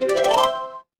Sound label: feedback level up